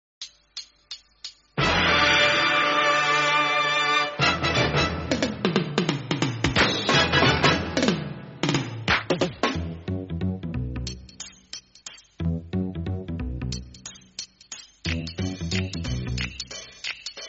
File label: Dance Music Instrumental; yeh dillagi 1994